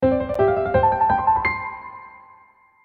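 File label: Ladder, sonnerie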